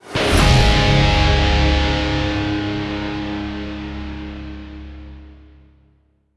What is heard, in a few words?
Notifications Rank; MPL Notifications